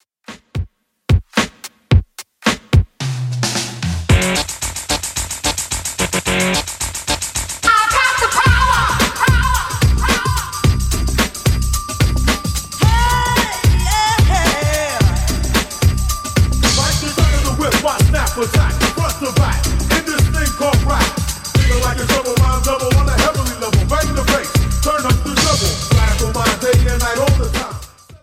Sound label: 90s DJ Friendly Redrum 110bpm